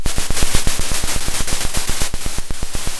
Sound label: pop, Sound of a drum roll